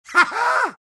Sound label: alch laugh; dota; alchemist